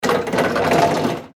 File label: forklift truck